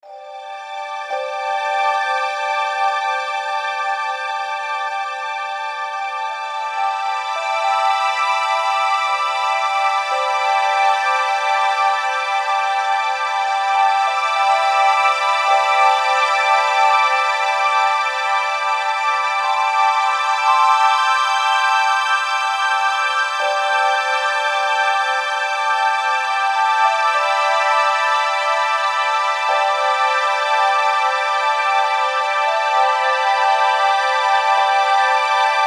Bed Reverb Vibes Strings, Bed Low Powerful Airy Shimmer Swirl; Bed Sound Effects